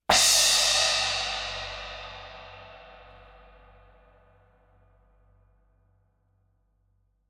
cymbal meinl 10 splash dark crash unfinished mallet; sonniss gdc